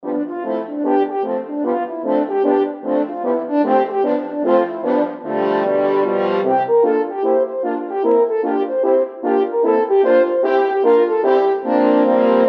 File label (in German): Hornquartett